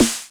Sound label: RM1X Drum Anlg Kit Snare Analog; Yamaha RM1X